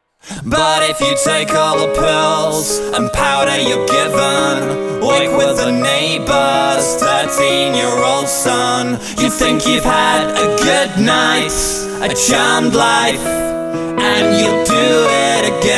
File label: Scissors Piano